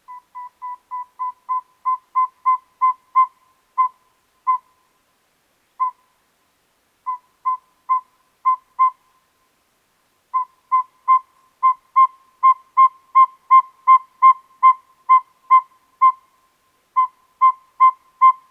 Som Coruja serra afiada

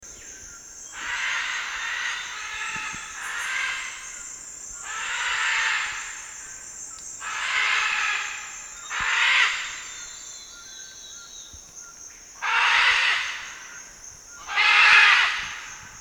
Som araracanga

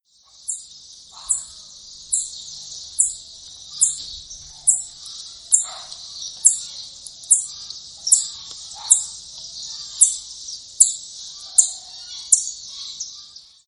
Som Beija flor preto

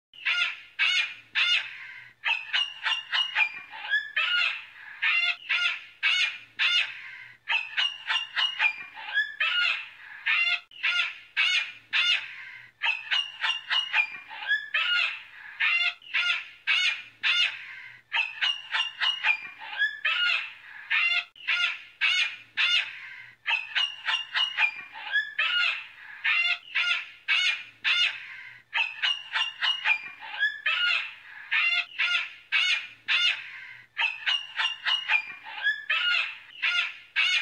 Canto da Cacatua, Som da Cacatua

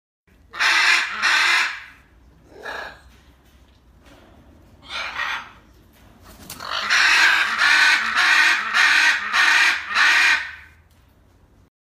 O canto da Arara de testa vermelha

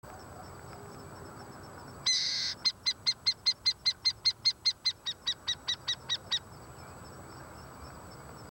Som Coruja buraqueira

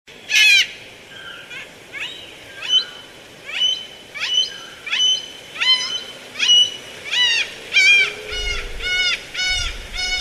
A cacatua de olhos azuis cacatua oftalmica

